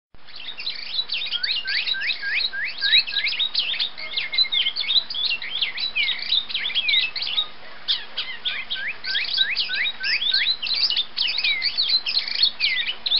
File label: Happy And Cute Birds